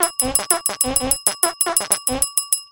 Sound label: clink fart long